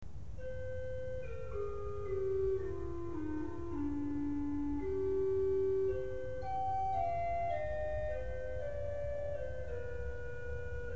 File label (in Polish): rurflet